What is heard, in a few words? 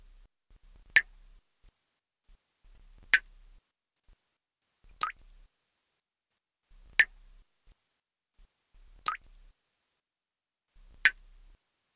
water drip fast